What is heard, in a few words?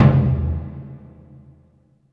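Burundi drum, Heart of Africa; Spectrasonics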